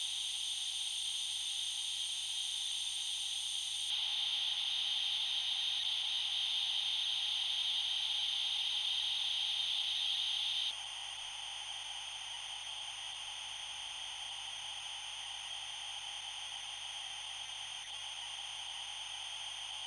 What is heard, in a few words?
noise